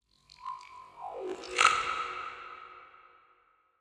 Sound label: water swell; soundscrate